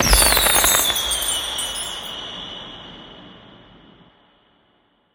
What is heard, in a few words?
fireworks